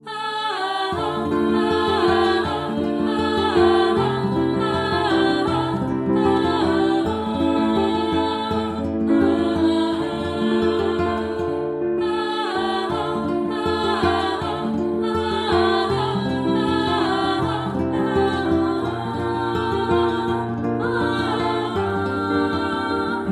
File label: Reverb Guitar With Vox